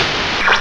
TV ON

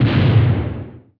boom; BESTSHOT; noisybit

